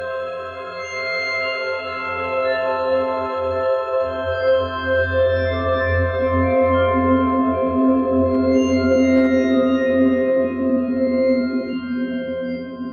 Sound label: nokia music ringtone; univere